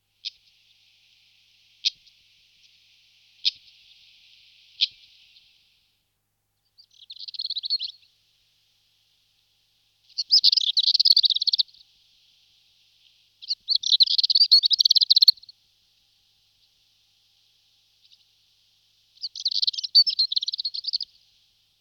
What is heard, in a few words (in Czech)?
Penice sardinska Sylvia sarda